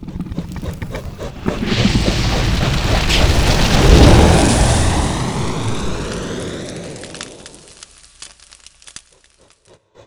firebolt, dragon; combat creatures; CosmicRage; CosmicRageSounds

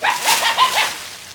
fox shock; hunt

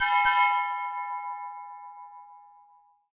auction bell

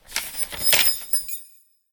story, comms

